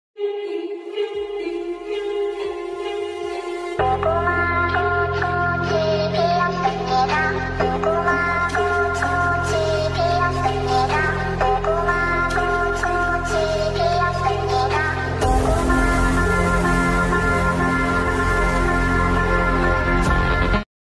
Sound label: bgm ringtone, Korean series; squid game